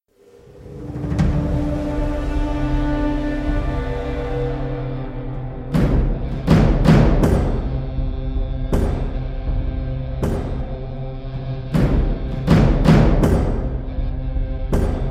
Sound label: Instrumental; Khelein Hum Jee Jaan Sey 2010